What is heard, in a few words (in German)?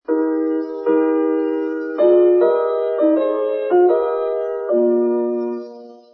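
pause, Radiojingles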